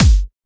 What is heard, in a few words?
Kick, Kicks; FLPRO